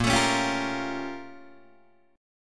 Bb Minor Major 13th Bb, Guitar, DADGAD; BbmM13; strummed; JGuitar

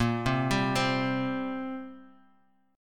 G Minor Double Flat 5th A sharp chord

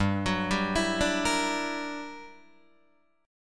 G Minor Major 13th, G, Guitar, DADGAD; GmM13; arpeggiated; 5,9,5,9,5,8

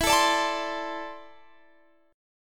E 7th Suspended 4th Sharp 5th

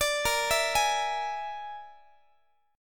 Bb 7th Flat 5th Bb down Guitar; Bb7b5 arpeggiated; x,x,17,20,20,19

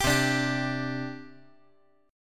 C Suspended 2nd, C, down, chord

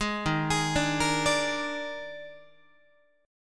Eb Major 7th Suspended 4th Eb, Guitar; EbM7sus4; arpeggiated

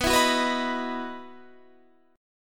E Major 7th Suspended 2nd

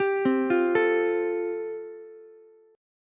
A Minor 7th Sharp 5th A, Ukulele; 12,12,13,12; Am7#5 arpeggiated; JGuitar

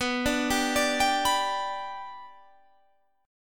B Minor Sharp 5th, Guitar, Standard; arpeggiated; JGuitar

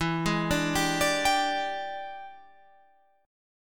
E 7th Sharp 9th, Guitar, Open F; arpeggiated

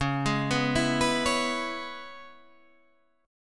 C sharp Minor 7th C sharp, chord

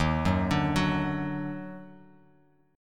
Eb Minor Major 7th Eb down, chord; EbmM7; arpeggiated; JGuitar

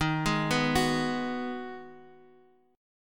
G sharp Minor 7th D sharp

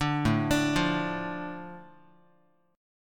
A Suspended 4th Sharp 5th down Guitar; Asus4#5 arpeggiated; JGuitar

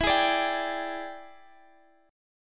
E 7th Sharp 9th E down, guitar; strummed

